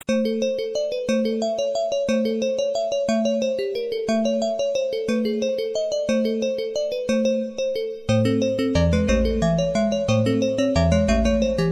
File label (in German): spieldose